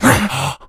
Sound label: become enraged, infected; npc; alert